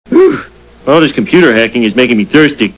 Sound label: hacking